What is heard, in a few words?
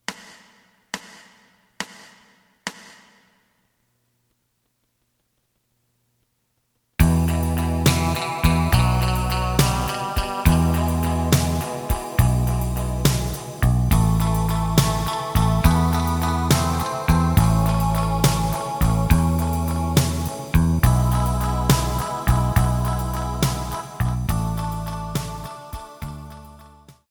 lead